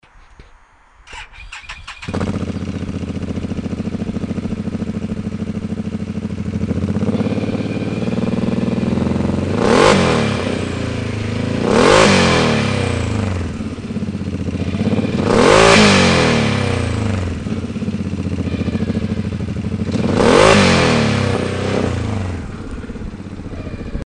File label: dump pipe